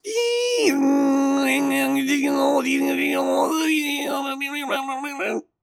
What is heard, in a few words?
Modem, Sound Effects; Foley; Judge John Hodgman